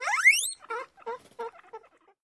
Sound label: rabbit